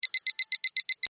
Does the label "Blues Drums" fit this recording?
No